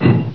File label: foot right; Half-Life; Alien Grunt